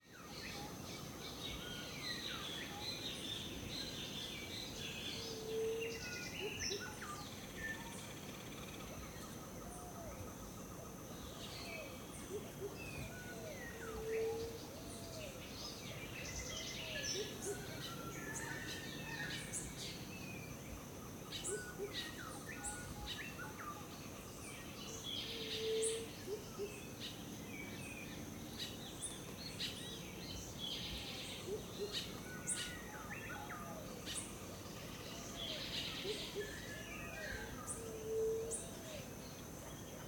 Rainforest, Costa Rica, Waking Up, Bird Calls, Faint Insect Bed; Jungle; Spaced AB